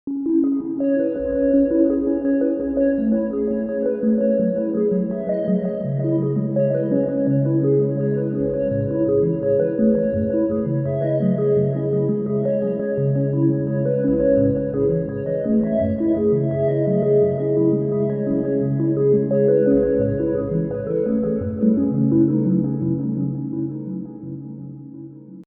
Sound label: Arpeggio Delay Rev